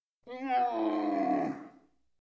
Camel Groan; wild animals

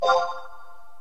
Menu Selection; video games; Final Fantasy XI

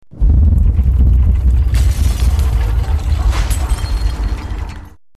Earthquake; video games; Battlefield 3

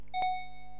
Selecting; video games; Street Fighter

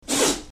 Dog Terrier Sneezing; domestic animals

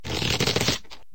Slingshot Stretched; video games; Angry Birds Rio

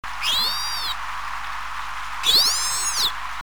Pilot Whale; ocean animals

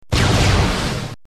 Plasma Beam; video games; Metroid

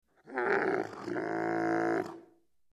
Camel Roaring; wild animals

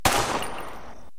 Skorpion Firing; video games; Call Of Duty

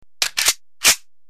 M1 Garand Reload; machines; weapons

